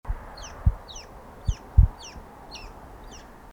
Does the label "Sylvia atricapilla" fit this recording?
Yes